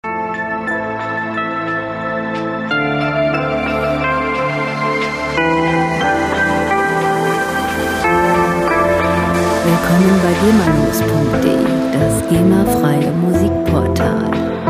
electronic romance

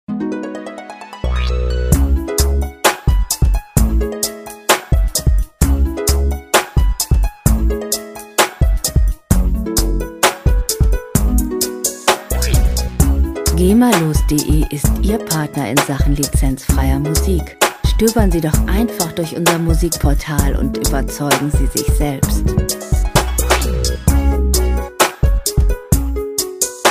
harp

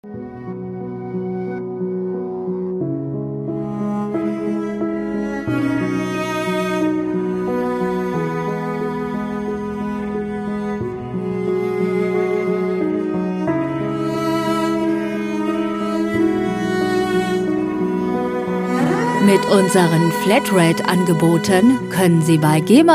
cello tales

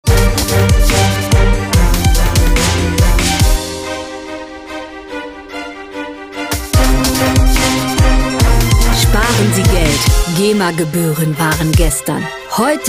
solfeggio

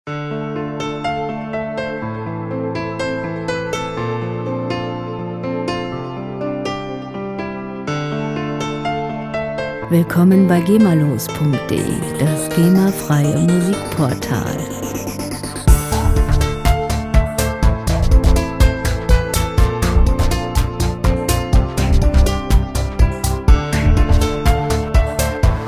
piano trance

